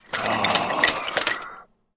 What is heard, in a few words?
armored skeleton aggro